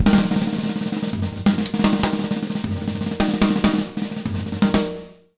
100 B BEAT, Jazz Latin Drumloops

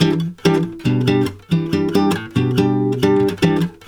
E MIN, Brazil Bossa; USB Soundscan; AKAI

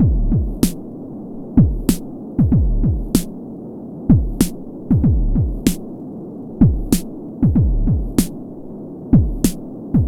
DOOM BOOM 95.2 bpm; Planet of the Breaks

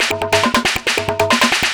drm 138, Techno, drum-loops; Techno ID; franchise-loop

